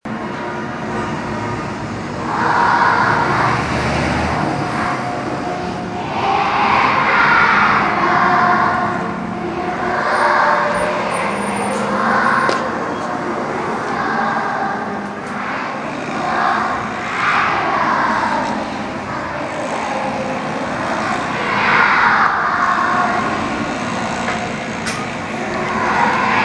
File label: Childrens day singing; China (June 1st); local primary schools